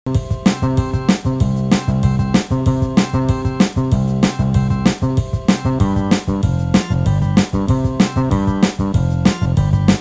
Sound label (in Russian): pank-rok (instrumental, zarisovka)